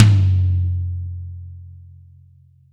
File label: tom 14